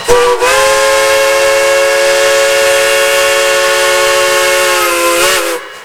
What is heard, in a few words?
short whistle, railfan